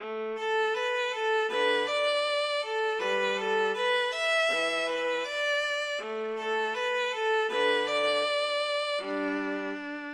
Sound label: freshtown rag, violin viola duet